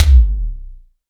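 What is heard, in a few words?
SDS V BD, bass drum; Best Service; Real Mega Drums VOL-1; MONO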